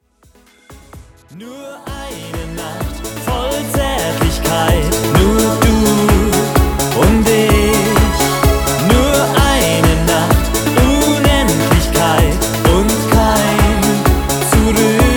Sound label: disco version